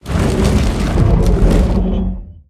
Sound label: Drawbridge Lower; Doors